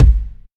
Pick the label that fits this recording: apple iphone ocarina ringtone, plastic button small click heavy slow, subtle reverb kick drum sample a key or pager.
subtle reverb kick drum sample a key